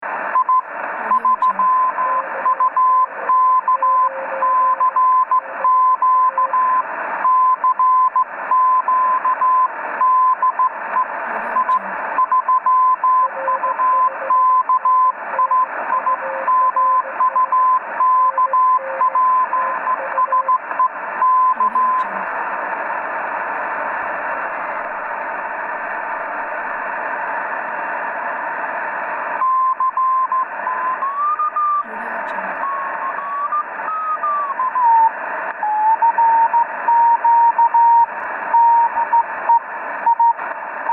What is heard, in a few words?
morse code transmission; soundark